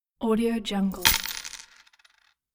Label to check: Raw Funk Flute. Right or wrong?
Wrong